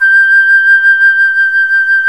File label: FLUTE, Flt vib; C Flutes 1&2; Roland L-CDX-03 Disk 1